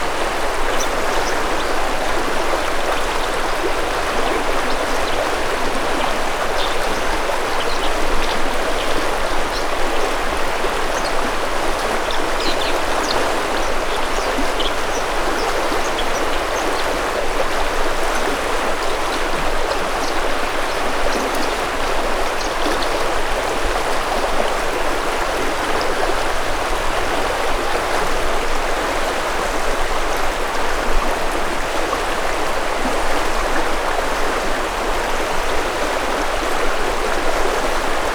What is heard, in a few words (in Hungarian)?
hompolygo patak madar